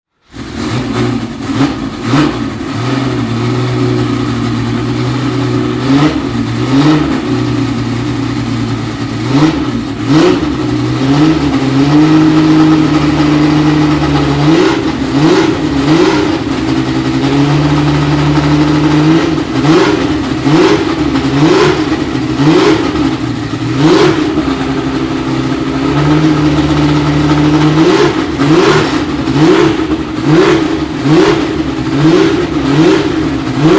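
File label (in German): Porsche 936, Porsche 936 - Starten und Hochdrehen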